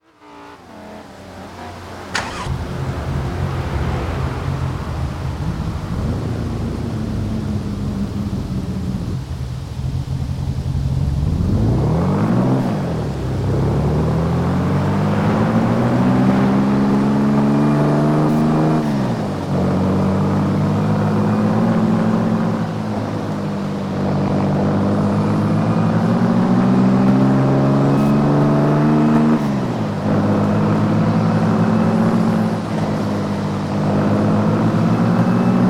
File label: porsche 911 e 1968